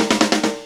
FILL, Grooves Filz Hitz

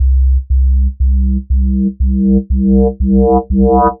bass basic sub woop kit; Polyend; Recue

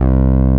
TB C5, TB-303; Masterbits; Vintage Voltage

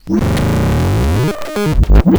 grind, EMU XL-7

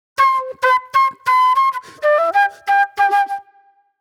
Flute

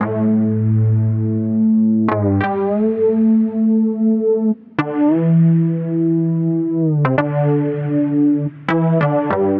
100 A Phr Low Unison; Zero-G; Future Wave

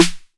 808 SD Tape Sat, Snare Drum; Tape808; Goldbaby Tape808 & Tape909

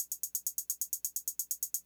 drums, Hardcore Breakbeat 129; Big Fish Audio; Hardcore Breakbeat Action; Core 129 Cmin

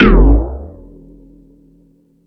Tool Kit, Drum Machines E-MU Pro-Cussion

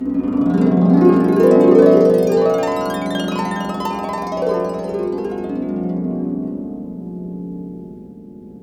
HRP GLISS; Roland - LA Composer vol. 1

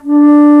Alto Flute p D2; Digital Sound Factory Virtuoso

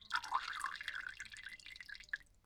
Water; BERZARRESOUNDZ

